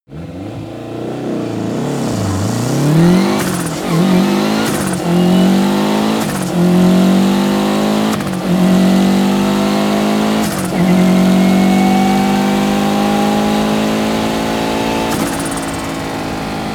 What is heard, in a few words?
car lancia delta fast acceleration full deceleration